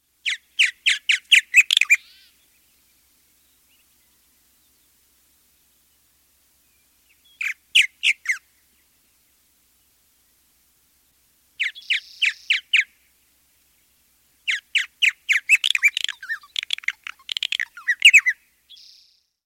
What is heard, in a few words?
purple martin